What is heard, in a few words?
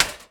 Prepared Drums